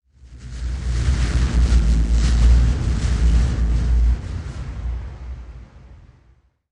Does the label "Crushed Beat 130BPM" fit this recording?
No